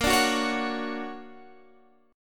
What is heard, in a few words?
D Augmented Major 7th A sharp, guitar